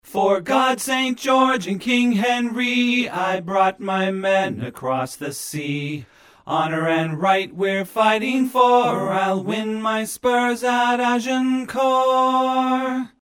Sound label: Tenor Harmony; Agincourt